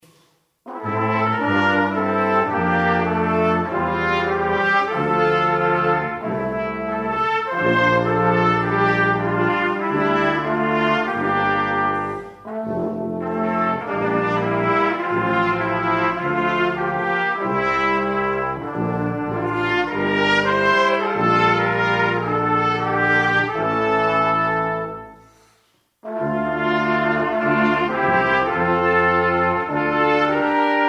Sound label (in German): Seht hin Posaunenchor